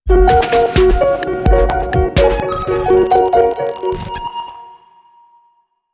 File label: Harps, Sting